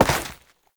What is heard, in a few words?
Step Dirt, Foley; Warframe; Oraxia